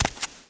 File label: walk; The Elder Scrolls III - Morrowind; FOOT